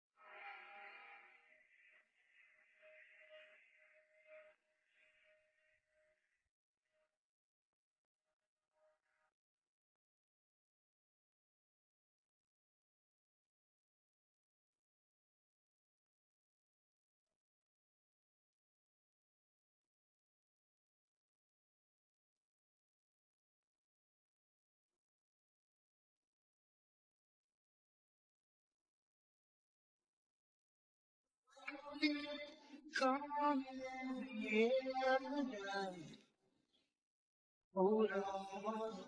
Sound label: vocals noise